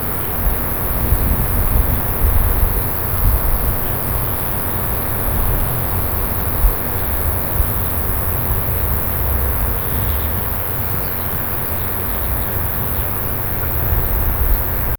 a light breeze on the, croaking

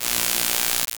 crackling surge of electricity

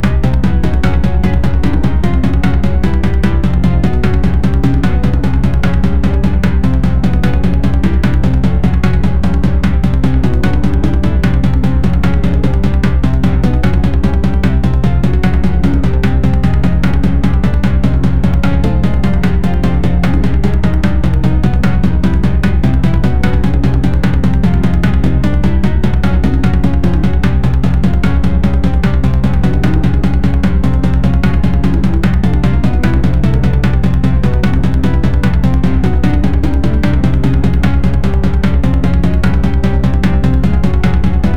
game soundtrack, futuristic

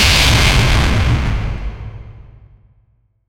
electric shock, electricity

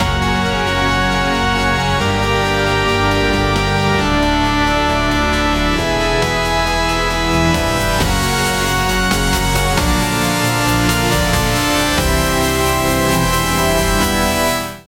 video, music

